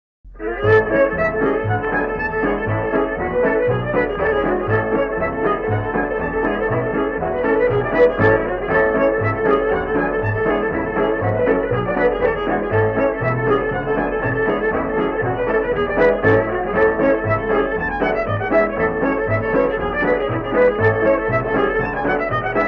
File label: instrumental